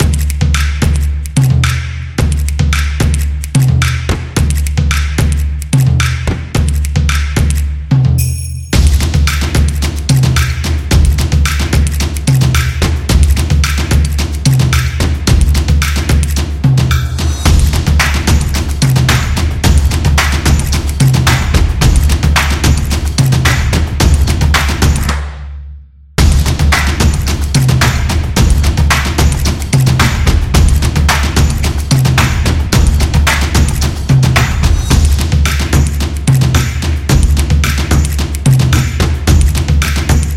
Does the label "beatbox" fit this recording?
No